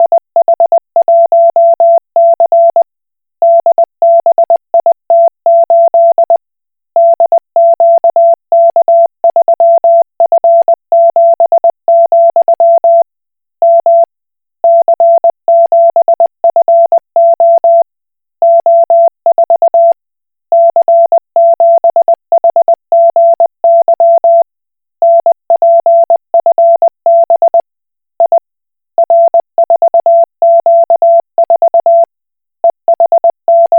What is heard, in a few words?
Koch Morse